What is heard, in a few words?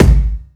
small reverb bass drum sound a key